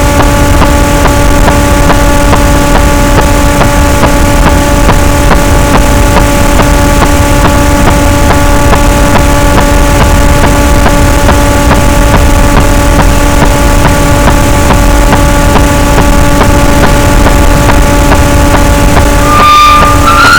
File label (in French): cachalot, boat; Physeter Macrocephalus